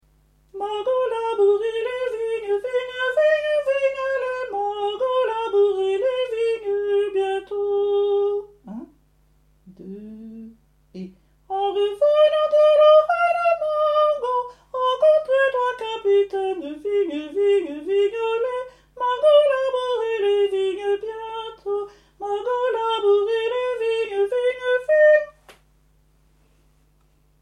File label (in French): margot labourez les vignes soprani